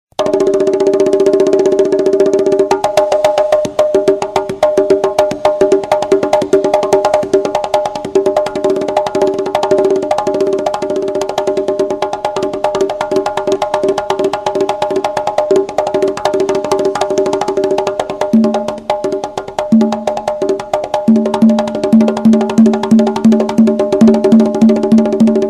congas solo

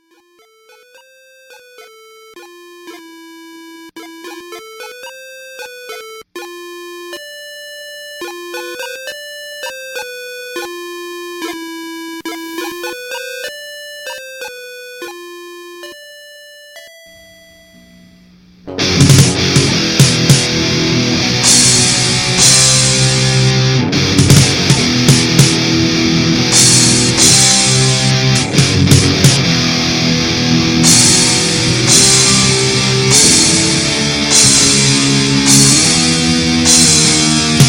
guitar game over

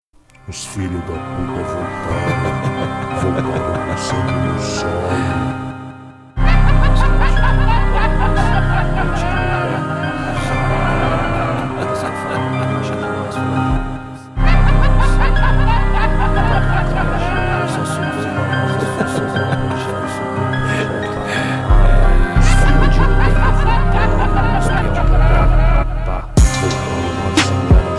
intro beat

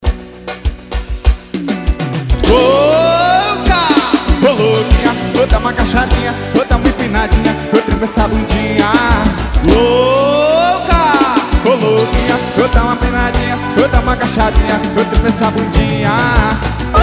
beat

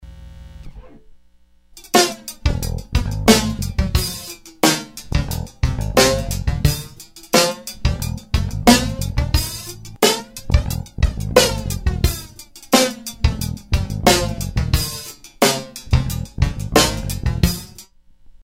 drum bass flanger

